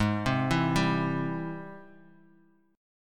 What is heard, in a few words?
Ab Augmented Major 7th Ab Bass Standard; arpeggiated; Ab+M7; JGuitar